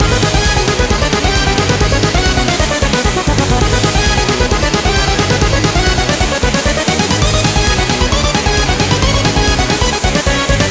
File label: BOSS Main Loop, Music; TMNT